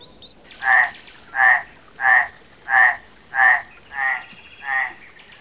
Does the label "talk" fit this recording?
No